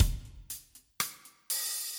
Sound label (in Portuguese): RITMO